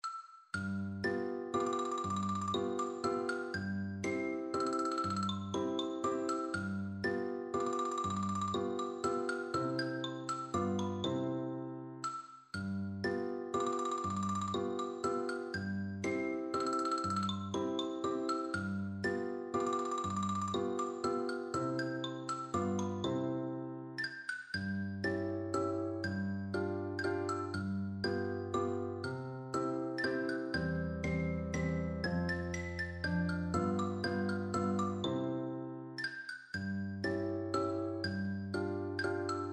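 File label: german dance xylophone, percussion; schubert; D420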